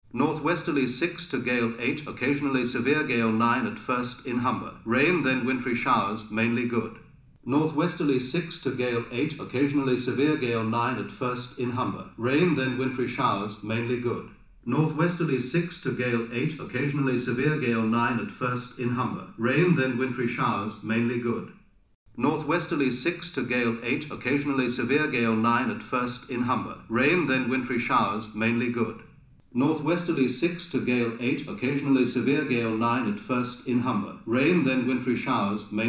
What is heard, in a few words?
AB 30cm Omni; Imaging